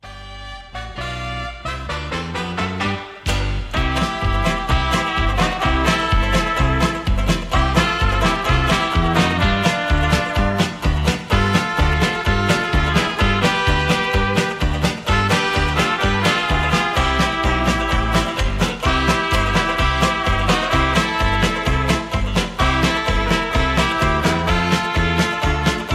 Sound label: Polka Instrumental